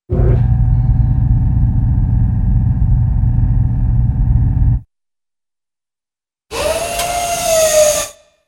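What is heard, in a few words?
Large Low-end Machine Hum with start Up & Steady, cartoon; Hollywood Edge; Cartoon Trax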